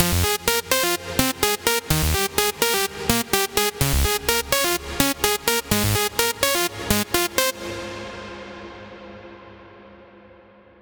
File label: Melody Lead Big Room Prog House; midi wizard